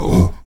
VOX AHOO, Afro Chants, Stacc Ah Oos

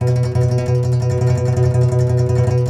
C. Bass Balalaika, Plucked Strings

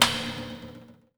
A#3 HIT, JAPANI PERC; Northstar; The Wizard VOL-1

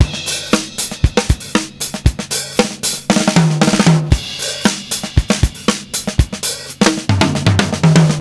Drum Doctor, Breaks; Zero-G; Beneath The Planet of The Breaks

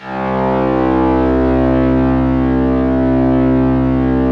C1, STRINGS, Yamaha VP-1; Music Store; JODYSTRINGS

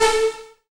lead syn, Techno Trance

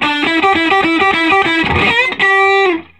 SOLO G, Vintage Blues Guitar

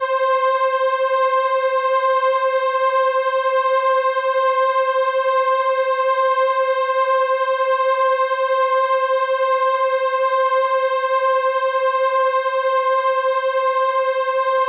Viola C6, ARP Solina

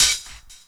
HH, HIHAT

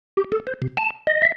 Single Riff, Organ

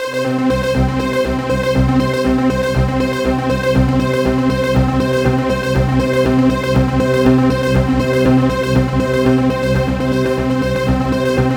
C4, SynthLine; 90 s Sample CDs; NiceBeats; SynthLine VOL-7

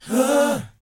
BOYZ, Vocal Planet, R&B Dance; Spectrasonics Vocal Planet

